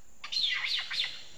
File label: dianas, vocalisations